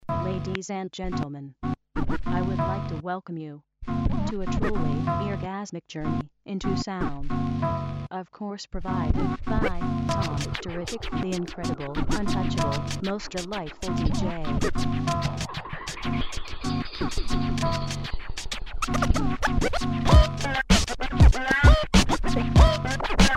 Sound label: scratch beat